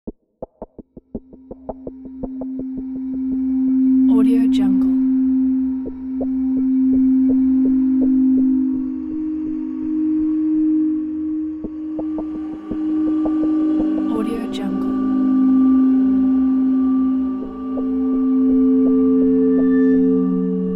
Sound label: creepy crawly scary things